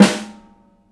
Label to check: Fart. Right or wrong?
Wrong